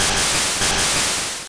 Bubblebeam; Pokemon; Gen 1; attack moves; RBY

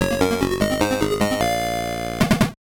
Level Start; Alien 3; Game Gear

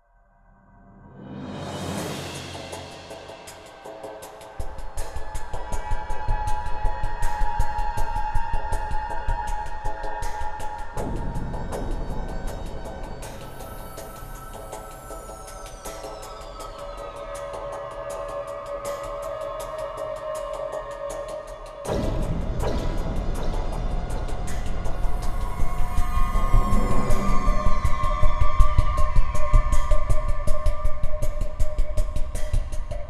Music Template - Tension; mass effect 2

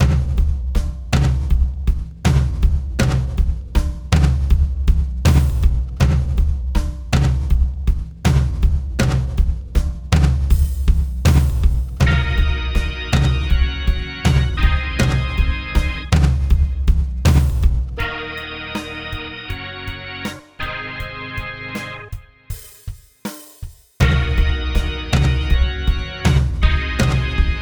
Bowser Bridge Drums; Newer Super Mario Bros Wii

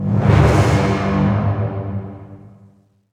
Sting

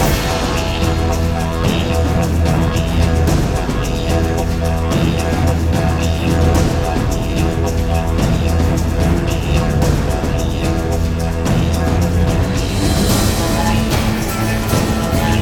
Nightmare Percussion; Hard West

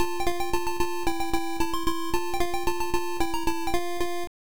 Correct Number Revealed; monkey academy; msx

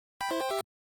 Slot Machine - Start

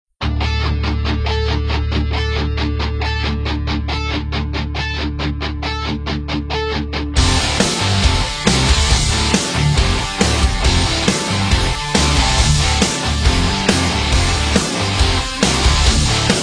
Mighty Metal; ramp car racing ps4 ps5 switch 2023

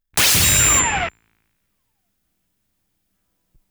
Coin

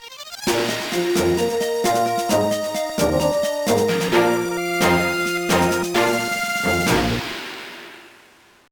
Game Clear; Boktai The Sun Is in Your Hand; GBA

